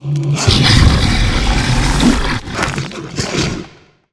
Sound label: lancer satk; monster